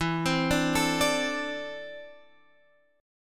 E 7th Suspended 4th Sharp 5th, Guitar, Half Step Down